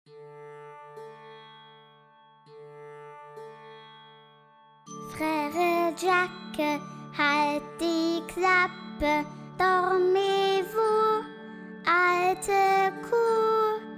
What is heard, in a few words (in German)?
Gesang Bruder Jacob